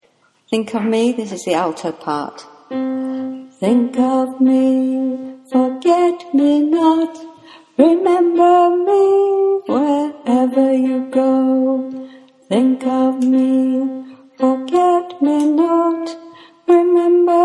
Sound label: alto, choir